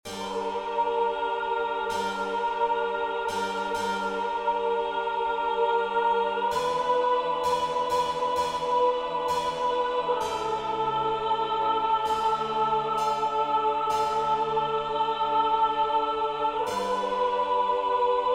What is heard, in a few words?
Magnificat sop